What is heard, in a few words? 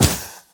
arrow; weapons; dota; medusa